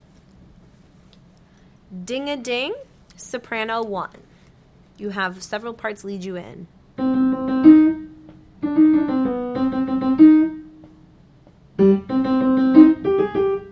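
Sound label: Ding Soprano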